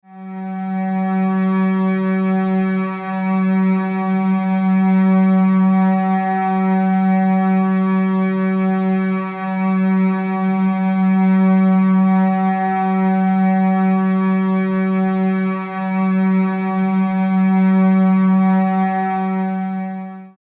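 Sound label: Low F sharp, tones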